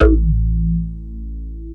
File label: techno, BASS; Techno Trance Essentials